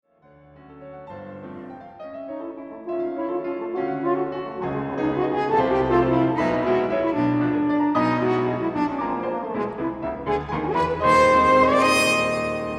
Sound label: Valse